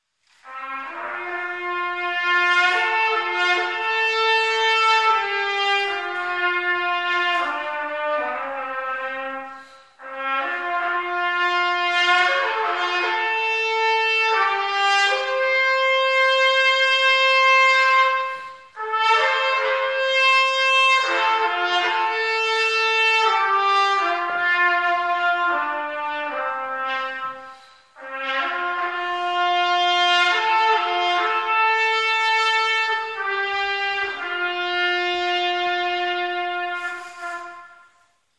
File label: trumpet